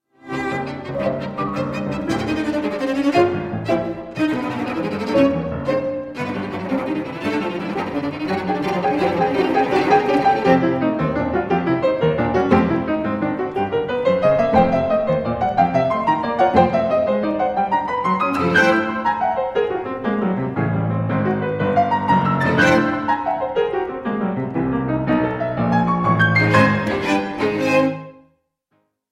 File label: trio for piano and strings; Andrzej Panufnik